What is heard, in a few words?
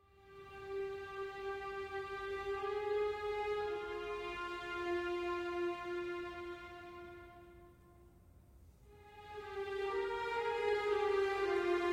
Theme for String Orchestra